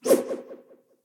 slashes; SyrinScape; Temple of Urgathoa

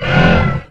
fab Verm Roar; The Elder Scrolls III - Morrowind

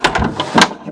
door

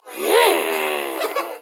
Hags total Group; SyrinScape

